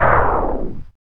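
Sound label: tape stop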